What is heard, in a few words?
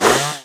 pre attack; dota; shredder